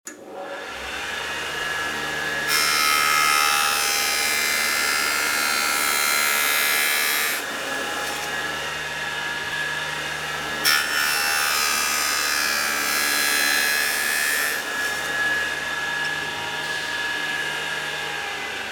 chain saw

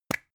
plastic spray can small open short

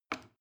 plastic button small release heavy

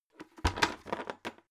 bucket rolling drop

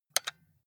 console controller xbox xyab press hard

